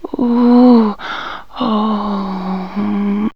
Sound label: SEX VOX